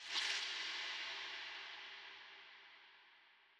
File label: ambience room shadow room scary ambience